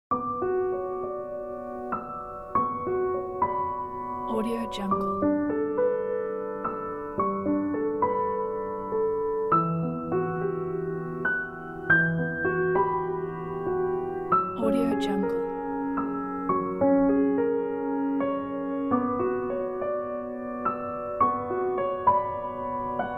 melancholy

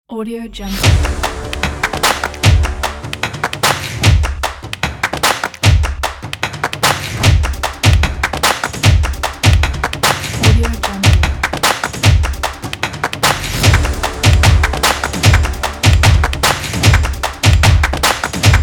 drumming

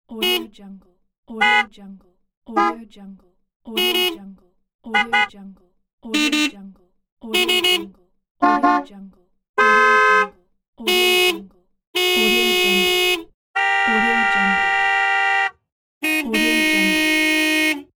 car horn

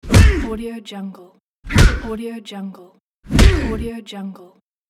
fight kick man

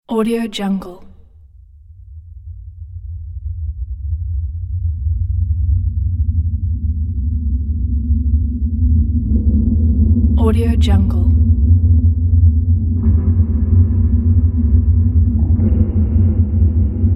dark drone

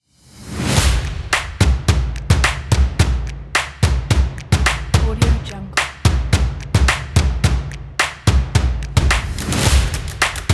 claps stomps percussion

